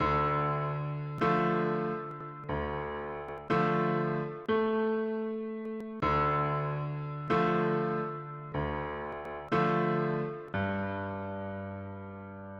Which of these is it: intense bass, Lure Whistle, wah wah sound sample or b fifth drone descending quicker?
b fifth drone descending quicker